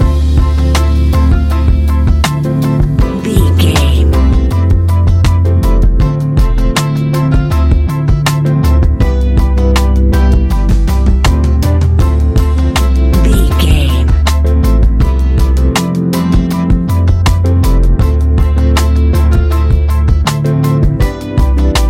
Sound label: chillwave vibes, A relaxed and chilling piece of music